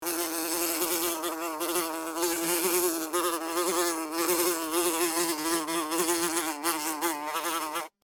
bug buzzzy

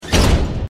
metal door closing

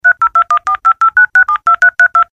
phone dial

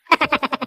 laughtrack; pbb

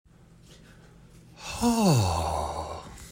asian ahhh

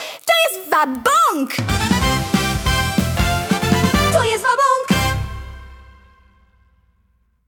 jingle

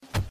block

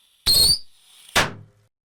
loud firework

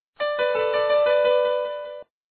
speaker test; state testing

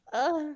moan

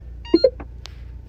renault captur reverse sound

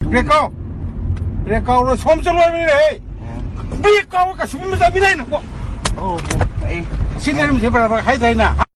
brake

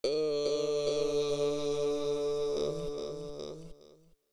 ughh

